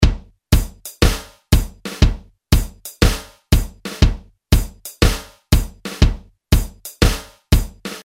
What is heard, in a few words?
REGGAE, Roland R-8